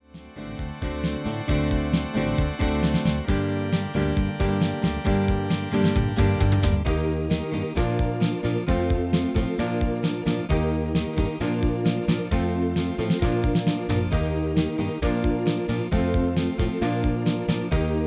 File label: MIDI